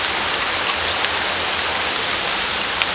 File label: rain street